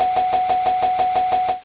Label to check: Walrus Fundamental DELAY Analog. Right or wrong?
Wrong